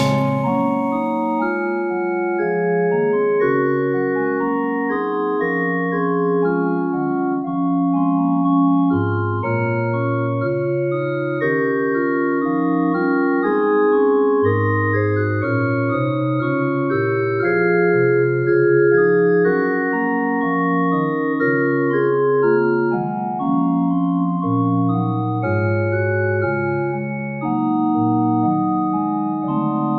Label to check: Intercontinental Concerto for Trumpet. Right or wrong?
Right